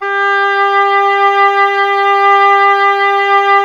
WND OBOE, Solo Instruments; Miroslav Vitous; Solo Instruments VOL-2

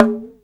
TABLA; Greytsounds; INDIAN PERC

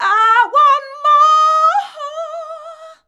"I WANT MOR", FEMALES

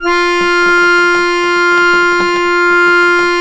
ACCORDION F4; USB Soundscan vol.63; Balkanish Traditions; AKAI

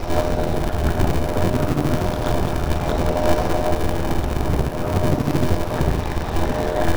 loop, techno atmos

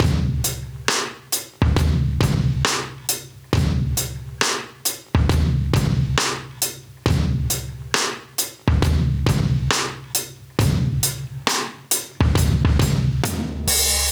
68 live breaks

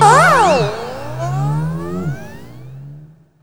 GAME NOISE; USB Soundscan vol.27

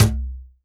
WORLD DRUMS, MIXED DRUMS; WORLD INSTR 2

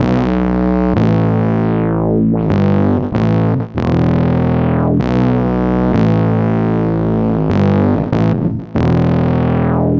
Distorted Bass, Electric Bass

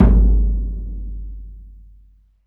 CEREMONY, DRUMS; Spectrasonics; Heart of Africa

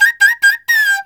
CHINA, Heart of Asia; Spectrasonics; CHINAFLT

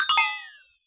glock fx; Univers Sons - Raricussions

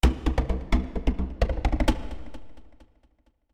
percussions vital; M8; percussion and foley

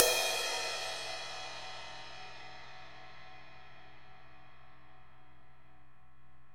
Ride Mid Bell up3; EMU PX-7

